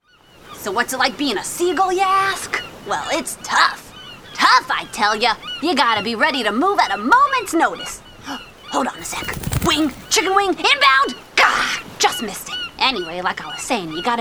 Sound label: seagull